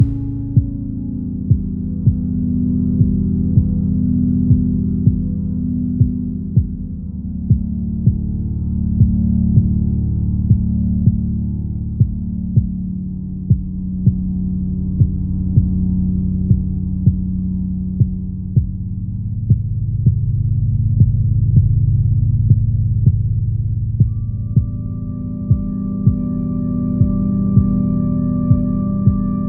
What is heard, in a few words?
Cave A (neutral), soundtrack; minecraft story mode gamerip 2016; A Block and a Hard Place